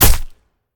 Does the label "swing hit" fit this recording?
Yes